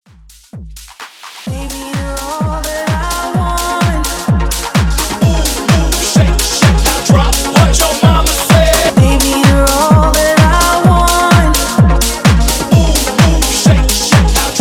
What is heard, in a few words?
Top40s House, 128 BPM